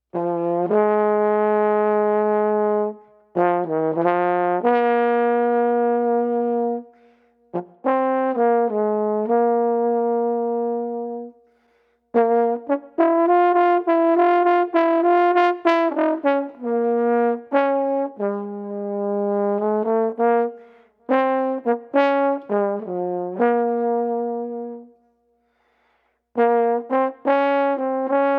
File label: Street Trombone Soulful Blues 68